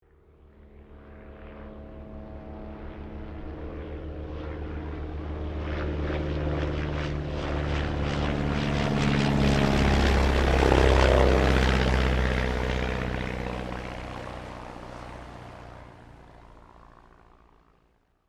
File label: Light aircraft fast overhead passby